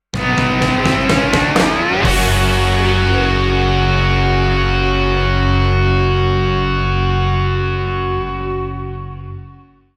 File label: hot wheels sting